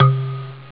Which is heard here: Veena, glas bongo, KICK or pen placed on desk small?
glas bongo